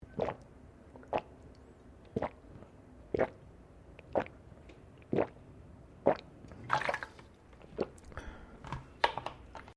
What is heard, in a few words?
Male Drinking Water Bottle; Male Vocal sounds; Human